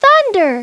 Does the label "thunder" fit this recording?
Yes